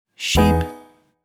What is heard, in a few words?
sheep